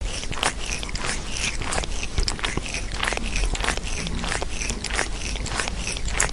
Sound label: cat licking